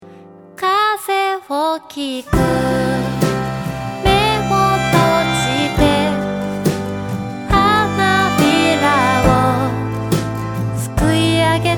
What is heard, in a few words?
music